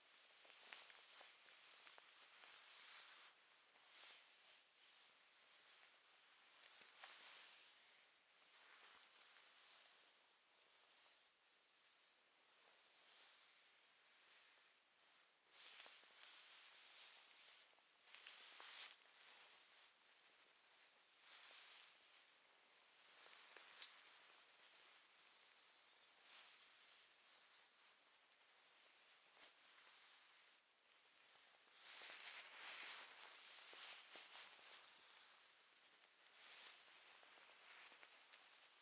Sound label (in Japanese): 布団もぞもぞ, ASMR; 動作系